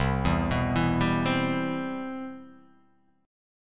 C Major 7th, chord

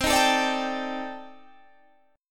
C Minor 6th Add 9th, C, down, Guitar, Open G

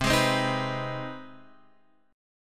C Minor Major 7th Sharp 5th C Guitar Open F; CmM7#5 strummed; JGuitar

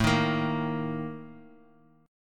Bb Major 7th Suspended 4th

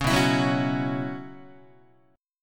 B Suspended 2nd Suspended 4th B Guitar, chord; strummed; JGuitar

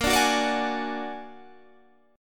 Bb Augmented 7th Bb down Guitar Standard; Bb+7 strummed; JGuitar

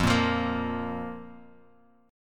Bb 5th F

